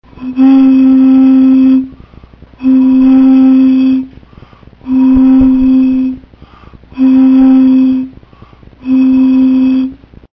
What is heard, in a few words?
sounding a bottle, Using a Bottle as Helmholtz Resonator; Some bottles sound more readily than others